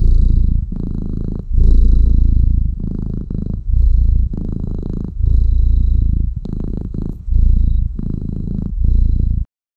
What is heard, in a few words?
soft calm cat purring